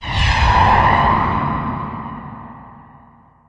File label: forcefield on